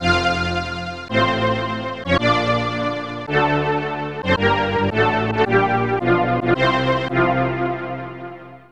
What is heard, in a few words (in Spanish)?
Cumbia de Perú Pero Sin La Cumbia; timmy turners globe run 2023